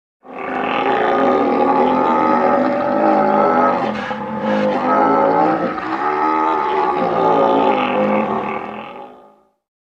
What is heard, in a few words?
Jungle Aligator; gnarled forest